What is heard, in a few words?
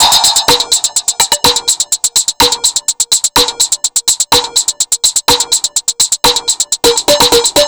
Percussive